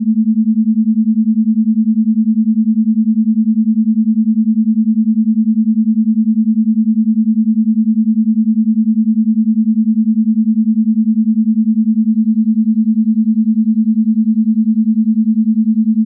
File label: alpha focus 10hz binaural; meditations